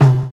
Clear Tom Drum Sound C Key, tom drum one shot tuned to the C note